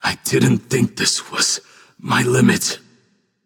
death; smite; rama

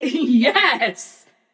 yay passion; chaac

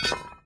defeat metal drop; smite; vulcan

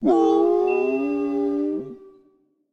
howl; smite; cerberus

